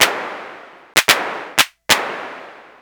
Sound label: Vermona DRM1 MK2 Clap